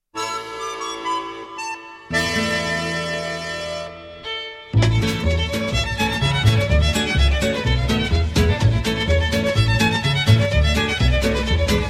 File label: Reel, Canada